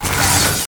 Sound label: skill ice summon